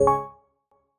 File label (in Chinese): 通用点击; 通用音效; LinkGame